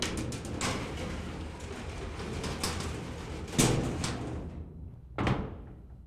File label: elevator close; Golden Crayon Sounds